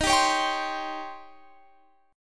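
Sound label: E flat 13th E flat, down, Guitar, Open F; Eb13 strummed; JGuitar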